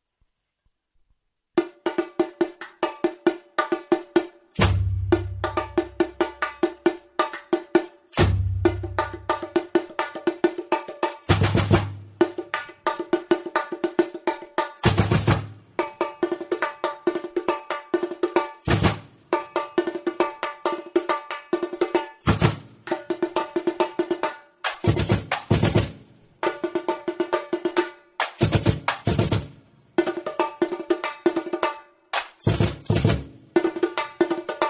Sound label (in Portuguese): Bateria esquente; sesimbra; MegaBateria2013